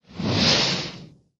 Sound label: Throwing Pizza Away; Good Pizza, Great Pizza; Android